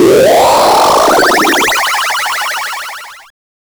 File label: retro game powerup